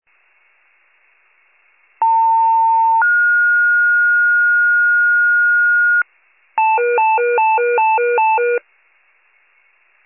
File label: FIRE pager alert